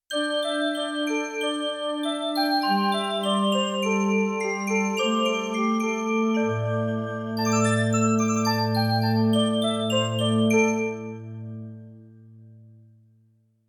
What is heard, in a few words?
FANTASIA, Yamaha TX16W; Europop Performance 1